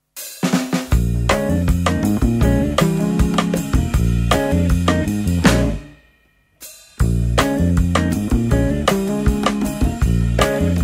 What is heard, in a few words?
Blues